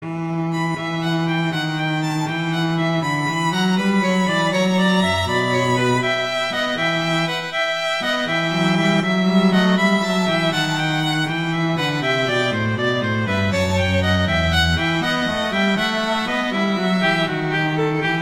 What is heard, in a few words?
Allegro; Corelli